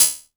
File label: hi hat closed, Korg ER-1; 300 Drum Machines; Kit 12